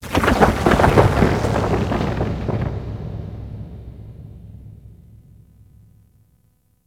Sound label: Thunder Large; Warframe; Ambience; Cephalon